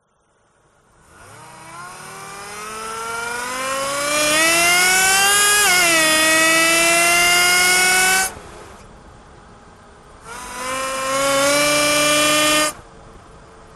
Vintage Car Chevrolet 57 Racing Start Double Engine; TRANSPORTATION